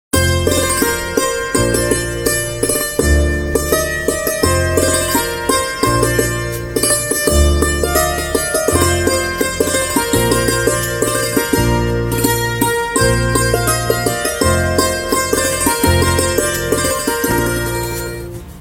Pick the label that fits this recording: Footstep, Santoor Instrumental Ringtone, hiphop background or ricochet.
Santoor Instrumental Ringtone